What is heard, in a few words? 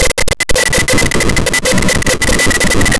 jaming